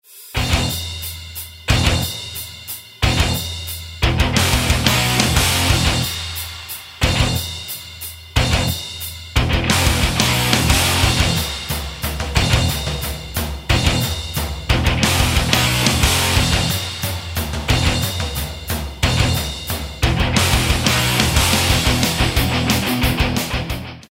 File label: metal